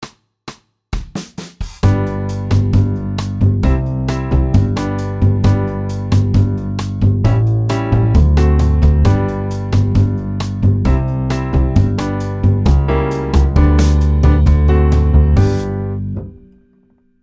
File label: Bossanova